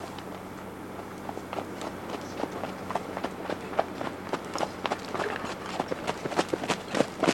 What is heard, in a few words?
Horse Canter Cut Off